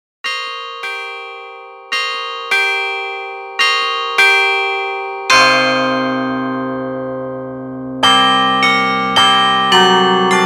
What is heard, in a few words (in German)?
Klimaglocken